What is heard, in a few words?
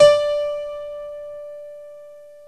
DBL GRAND; OMI - Universe of Sounds